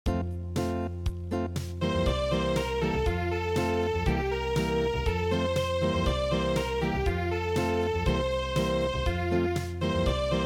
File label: Unplugged